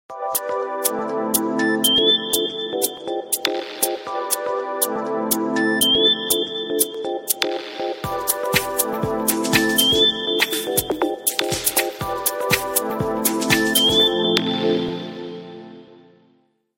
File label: nokia ringtone; glow